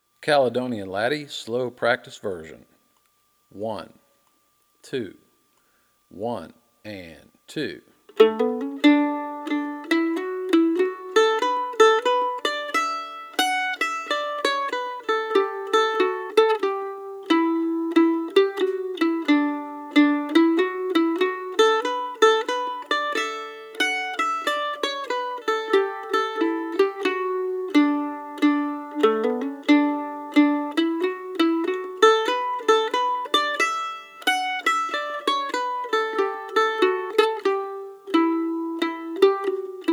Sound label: Practice Version